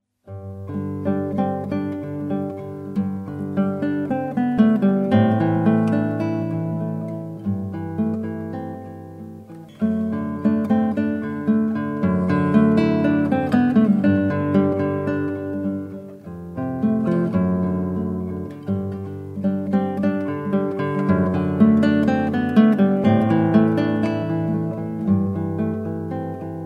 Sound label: Romantic Guitar